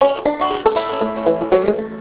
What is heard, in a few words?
banjo True Speech 1 bit; telecommunications; numerisation; berthet; module numerisation